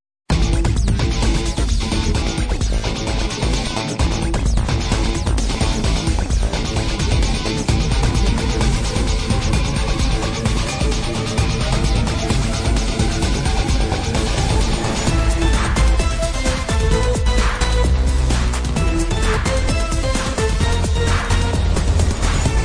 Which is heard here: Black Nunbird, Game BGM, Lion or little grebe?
Game BGM